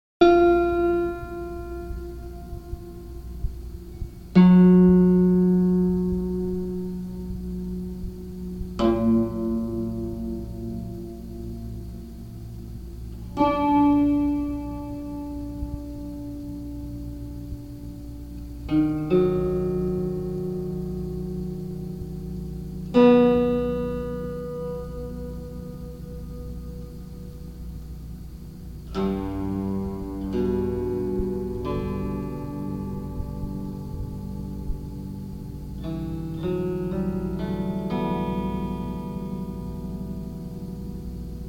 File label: Hammered, harp